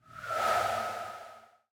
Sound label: Air Whistle Woosh Pass High Tone